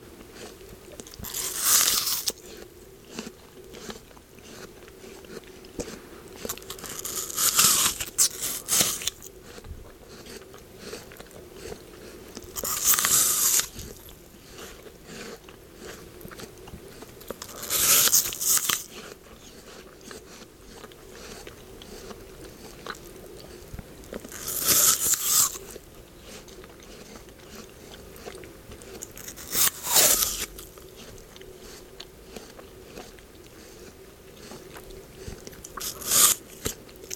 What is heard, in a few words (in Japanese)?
左右耳 女性がリンゴを咀嚼する音 ASMR; 快感ASMR～両耳でリンゴを咀嚼する音(素人女性フェチ音)